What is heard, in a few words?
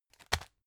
tissue package drop